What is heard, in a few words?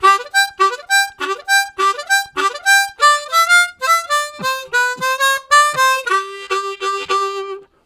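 Jukey Syncopated, Harmonica Riffs; Zero G - Harmonica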